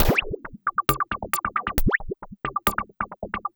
Drums Strange

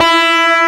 E3, GUITAR; AKAI S-Series CD-ROM Sound Library VOL-8

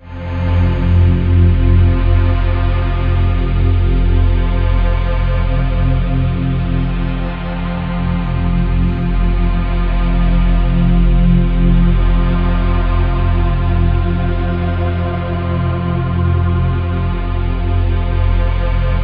GHOSTIC, SYNTH SND, Ominous Drones; USB Soundscan vol.48; AKAI

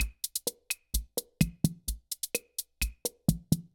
Guild MG10C-1 Loop, Drum Machines; Guild MG10C-1 Sample Pack; 300 Drum Machines

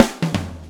SWNG, SWNG SHFT

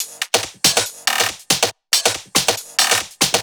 Loops 140 BPM Hihat Percussion Loops

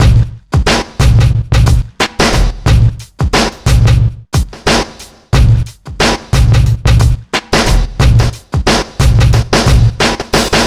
kit 090bpm; Zero-G; Downbeat Dance Grooves

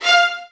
VN FX, Virtuoso Strings

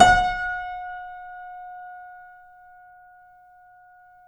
C7 FF, The Ultimate Piano Collection; East West

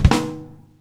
FILL

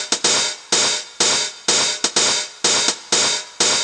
drum loop verbe hat 125, Classic Chicago House; Sample Magic; Drum Loops

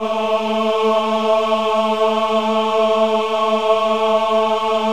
VIB A3, VIBRATO, Voices; Spectrasonics

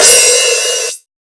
Hats, Alesis Performance-Pad

